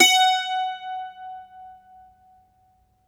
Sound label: MANDOLIN; E-MU Producer Series Vol. 7 – Old World Instruments